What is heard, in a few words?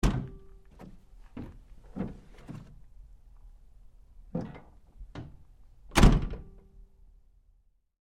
Truck GMC Savana Door Rear Open-Close Lite; 2010 GMC Savana; Trucks